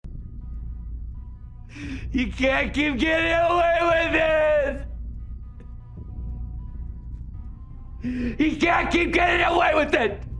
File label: crying; breaking bad; audio meme